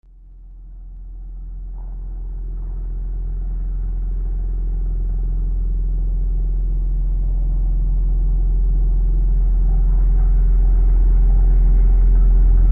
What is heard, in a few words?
sewing machine dub